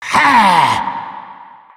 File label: attacks